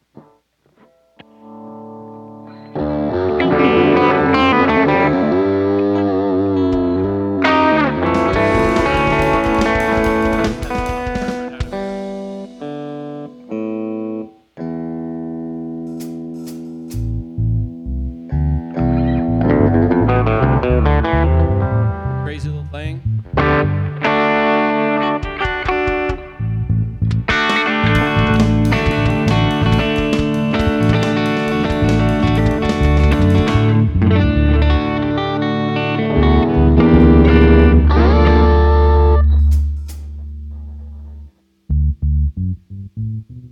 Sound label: Strings Emphasis